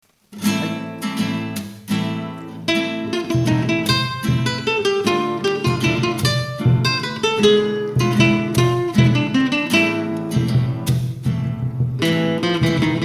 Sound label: guitara